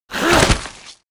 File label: Toadrin die; FlyForInsanity